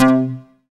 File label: K-2000, Synth Bass